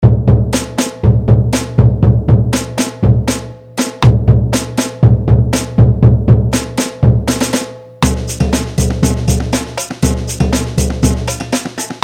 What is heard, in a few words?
Funk